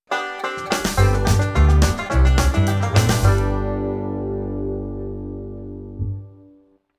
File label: (Jingle) Turret Minigame Won